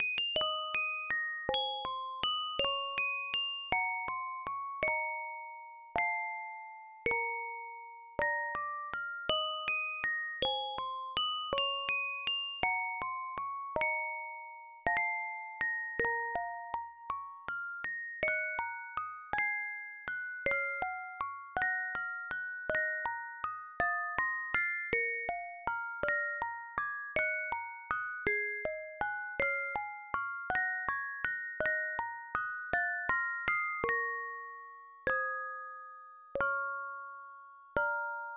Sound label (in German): Orgel; Kakyusei; PC-9801